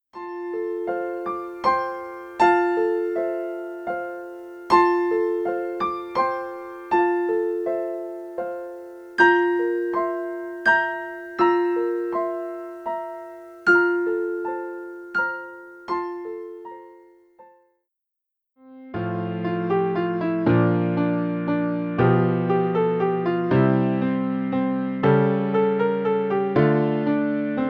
Christmas Piano Collection Piano Solo and Four Hands; arr Dias Karimov; easy intermediate